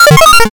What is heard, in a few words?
glitch